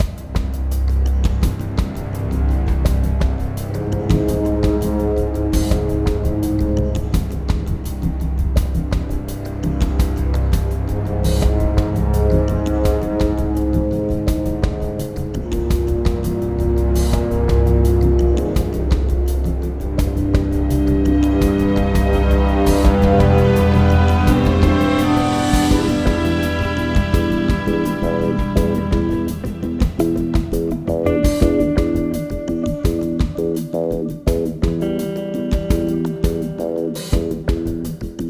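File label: Low Combat loop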